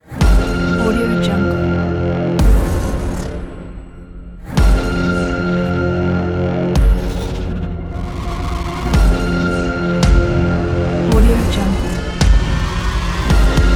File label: aggressive dark trailer